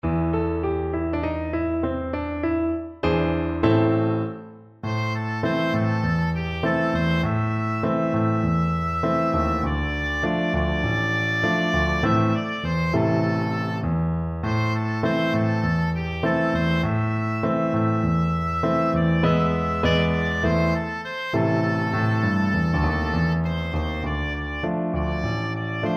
Didnt My Lord, oboe

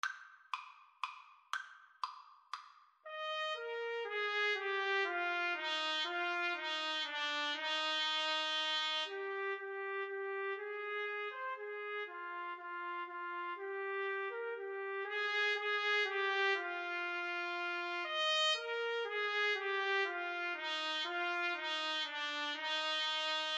Menuett trumpet trombone duet

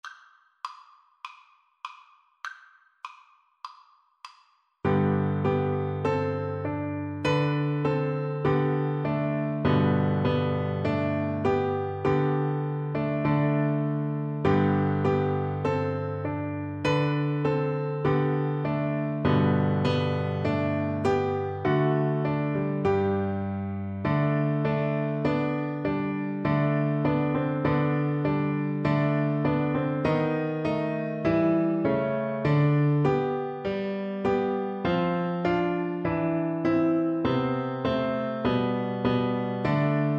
ode to joy, recorder; beethoven

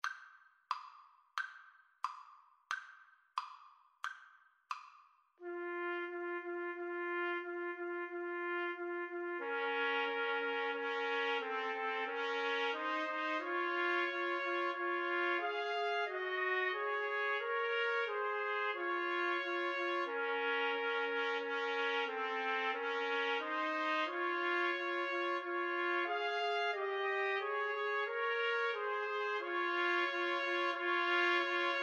pavane 3TPT, Trumpet Trio

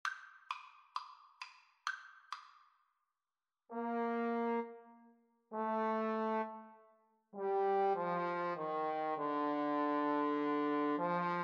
Trombone Duet

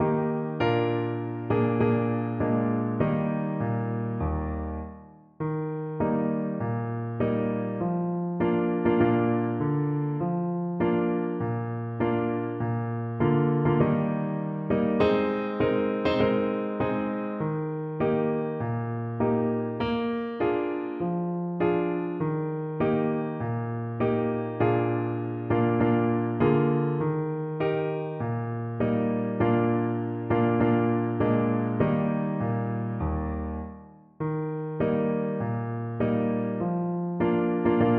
clarinet

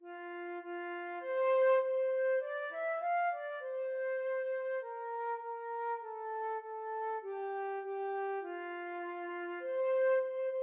Baa Baa Black Sheep, flute

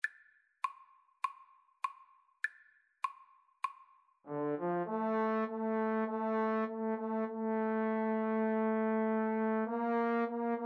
Trombone Duet

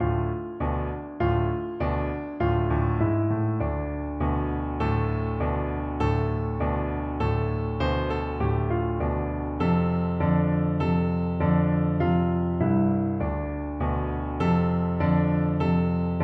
my blue shoe piano

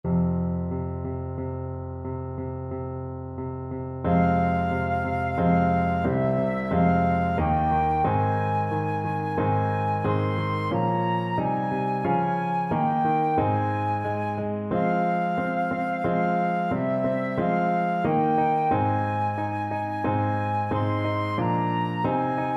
pavane, flute

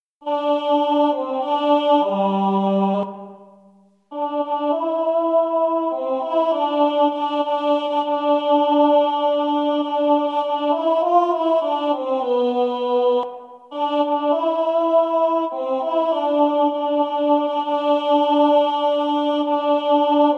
CHOIR ten; Shosholoza

